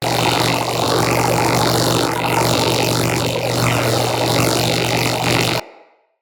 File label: Iron Blast Charge Loop; Warframes